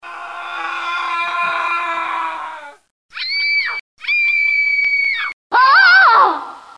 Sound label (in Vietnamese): Tieng nguoi la het kinh di